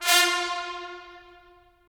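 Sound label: brass trumpets staccato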